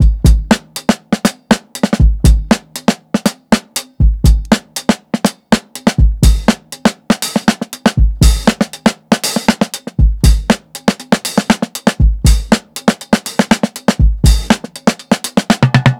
120 LET'S GO, DRUMS LOOPS; BASEMENT DRUMS VOL 2

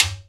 Timbale

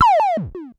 Perc Synth Raw

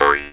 D JAW, Primitive Instruments

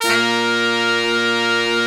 BRS, R&R Horns; Roland - Brass, Strings, Hits and Combos

